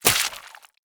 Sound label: zombie; zombie plevok